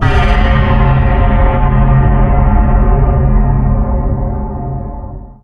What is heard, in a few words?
mysterious perc, ambient